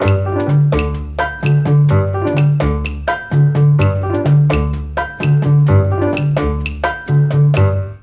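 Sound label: bass strings, Mellotron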